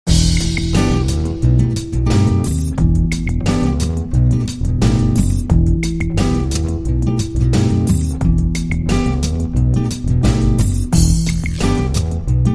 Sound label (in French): Acide Jazz